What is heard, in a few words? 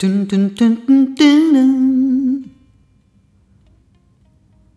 Rhythmic C